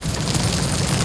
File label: droideka Roll, droids robots